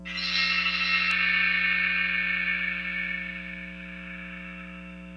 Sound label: bell